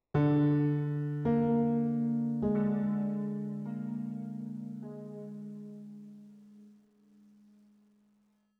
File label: One shot Piano; zombie army 4 dead war ps4 stadia switch windows xbox one gamerip 2020